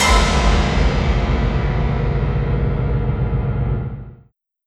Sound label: Piles Hit